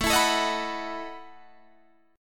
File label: E Major 7th Suspended 2nd Suspended 4th